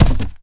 cardboard box impact hard

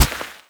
carpet impact bullet; physics; surfaces

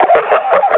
laugh, combine grunt; vo

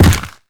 step heavy, zombie blind; Half Life Alyx; creatures

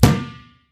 rubber tire impact hard; physics

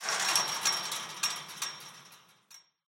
ambient chain link rattle, metal

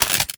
electrocuted spark

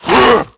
attack grunt, zombie; creatures

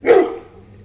dog bark close; Counter Strike Model Pack; CS:GO

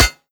metal prop, bullet impacts; physics; Half Life Model Pack

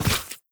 step light, footsteps, player, mud

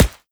dirt, bullet impacts; physics; Half Life Model Pack

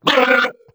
ballbot ping; player; Portal 2

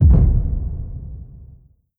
step lfe, strider; creatures; Half Life Model Pack

